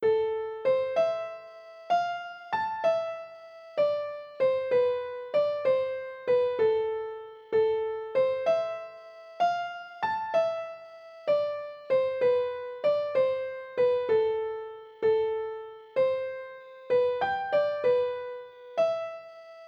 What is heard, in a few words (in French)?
valse; boeuf trad